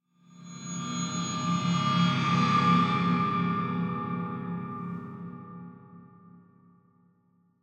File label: Drone Feedback Scream Tone; Hollywood Edge; sound designer's toolkit v2